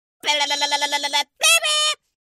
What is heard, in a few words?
to be ridiculed